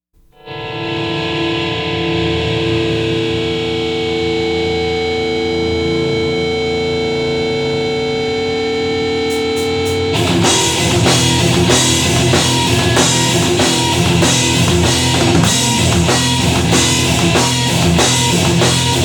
instrumental; writing session

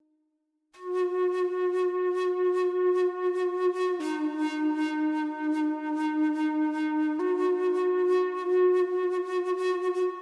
native american flute

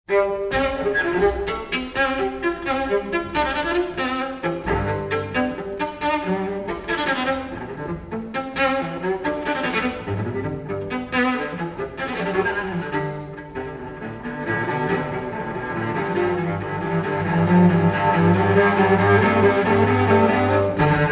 suite for cello solo no 3 in c major gigue; johann sebastian bach

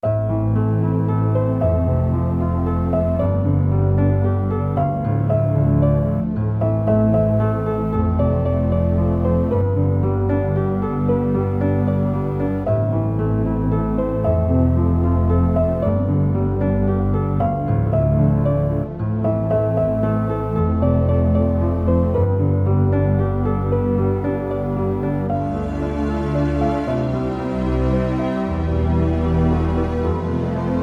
piano

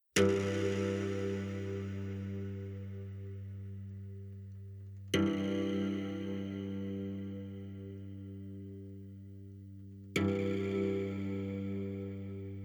string quartet

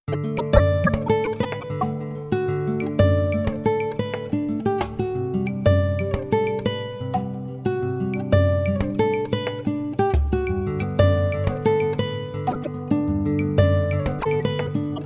acoustic rain

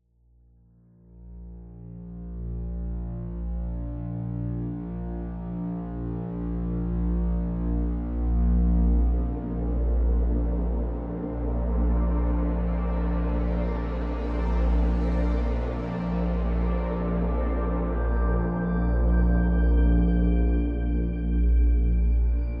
ambient

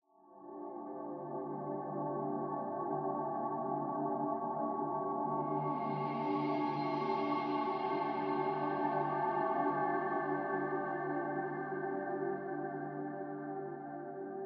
ambient

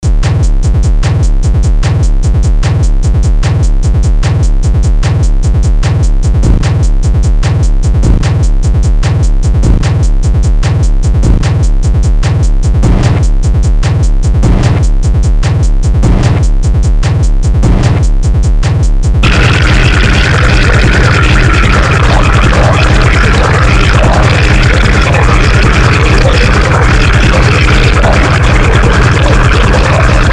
frenetic organ

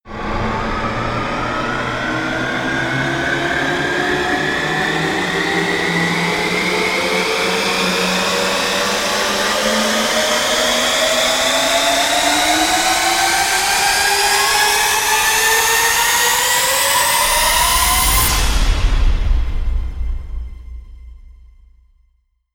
rise long sky high

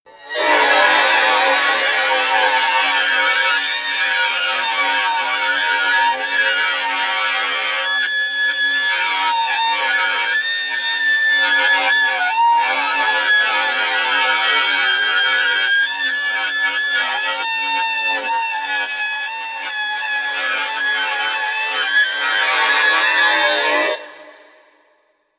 demon screeching

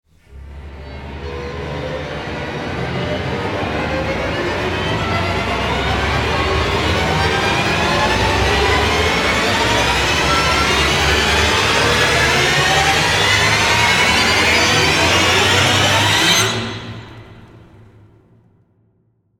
ominous rise live

